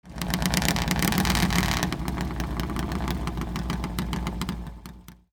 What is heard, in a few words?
allard hemi idle